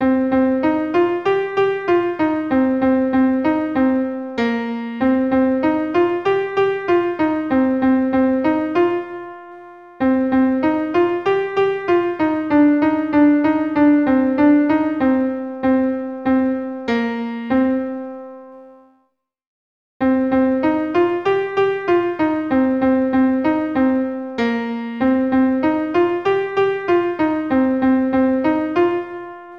piano

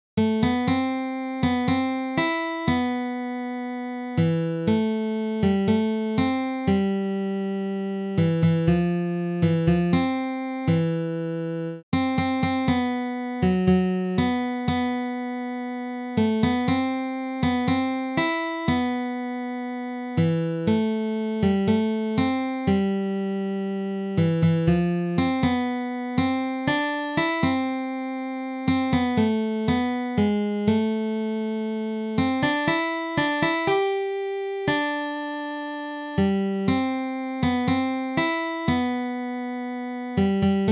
guitar

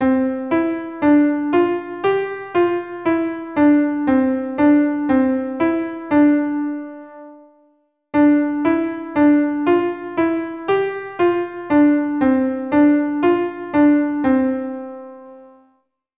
piano

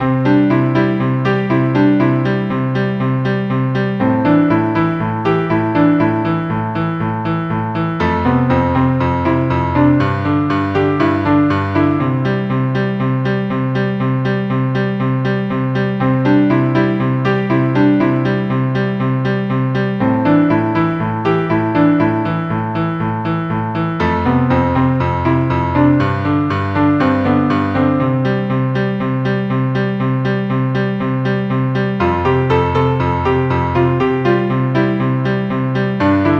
piano

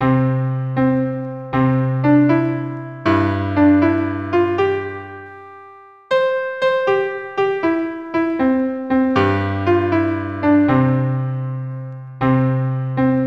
Row, Row, arrangement

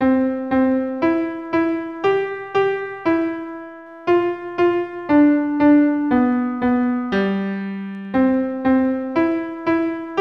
Surprise Symphony - melody

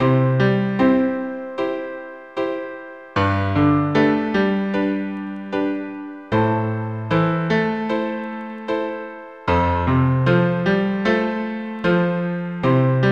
Pop-Rock Etude 76 bpm

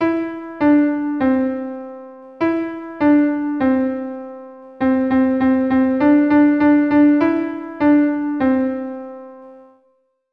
melody; Hot Cross Buns

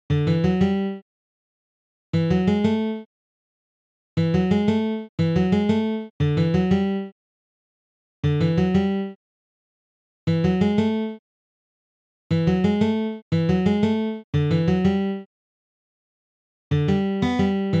guitar

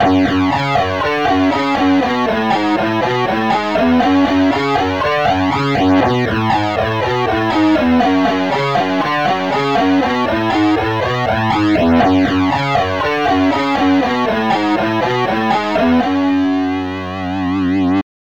Riff

